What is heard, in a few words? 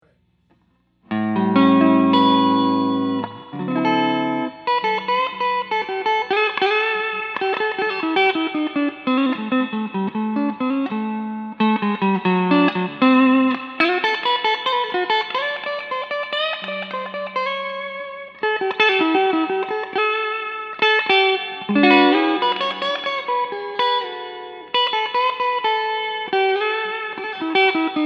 Tele standard neck clean blues